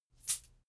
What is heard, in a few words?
chain mail armor movement